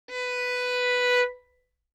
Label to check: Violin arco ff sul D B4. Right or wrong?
Right